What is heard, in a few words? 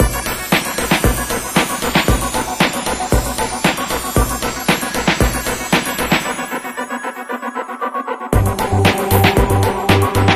Dark Loop; FutureWorld; Trail of Destruction